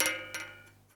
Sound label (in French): Fouet